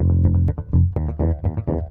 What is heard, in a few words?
Bb 120 Funk Rock